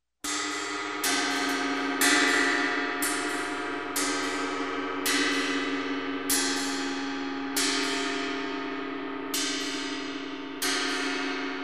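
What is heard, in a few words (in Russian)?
удары по гонгу металлический эффект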